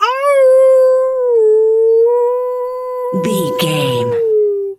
wolf howl long

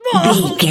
voice female hurt pain choke

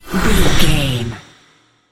magic flame of light

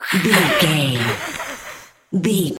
ghost witch voice hiss

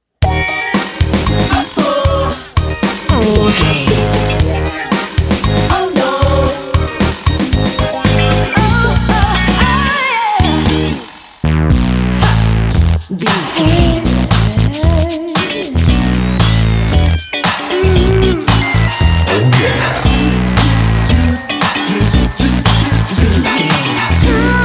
sexy quirks

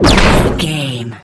energy blast large

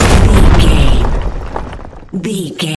rock earthquake impact

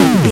retro impact hit

